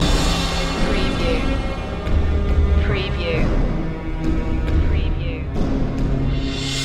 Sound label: heroic loop